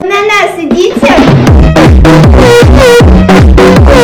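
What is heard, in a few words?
bass boosted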